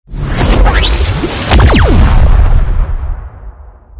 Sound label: Plasma Gun Fire Ringtone